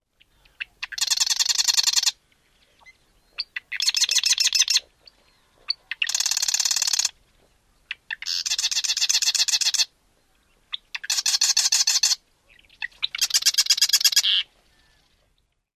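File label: song western subspecies, marsh wren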